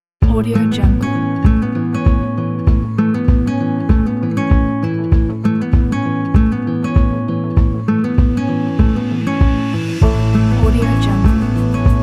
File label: acoustic vibe